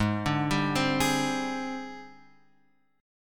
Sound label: G sharp Major 7th Flat 5th, Guitar, Open F; arpeggiated; chord